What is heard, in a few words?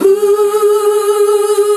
Chorus G#4